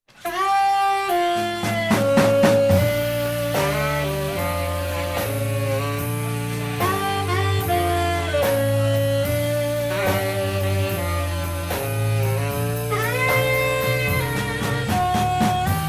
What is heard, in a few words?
blue sax